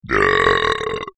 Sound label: brap